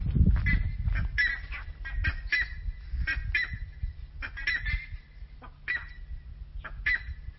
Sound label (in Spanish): coclin tautaco, aves